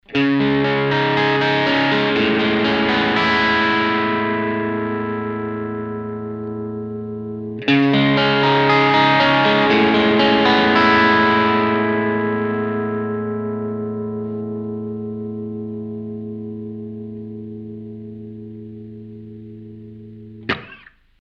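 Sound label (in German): two notes le crunch kanal ab cold strat steg pickup; analoge speaker sim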